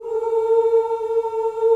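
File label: B3 FEMALE CHOIR; CHOIR OOS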